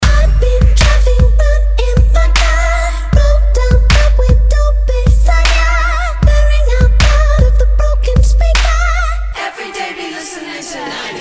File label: 90s music